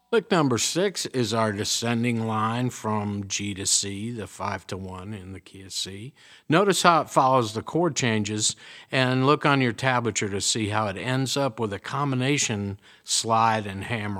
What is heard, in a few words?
resonator pedal, steel guitar